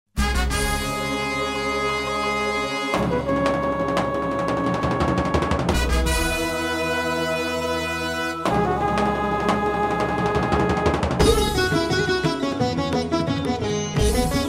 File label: Instrumental